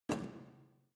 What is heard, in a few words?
snake Turn; templeofnudges